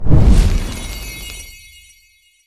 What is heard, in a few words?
fire up bonus; pond5